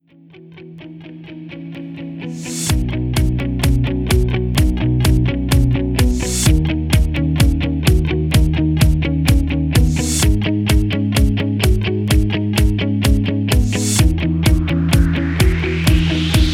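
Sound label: Instrumental soundtrack of female singer without lead vocal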